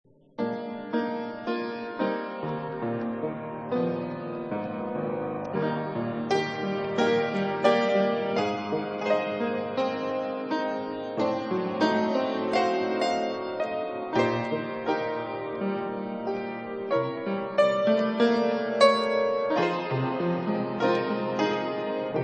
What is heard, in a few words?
Piano